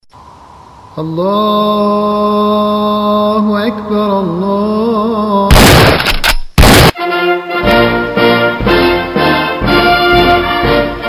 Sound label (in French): NOUVELLE SONNERIE PORTABLE